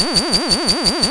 IN UFO, SPATIAL; East West - Global Sounds

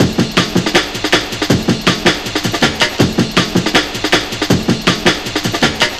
160, Drum Loops; Total Drum&Bass

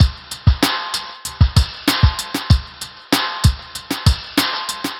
Drum Loop, Drum Loops; Sonic Foundry (Sony Creative Software); Leo Cavallo Bass Taster

